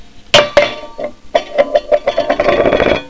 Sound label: Drop Tin Can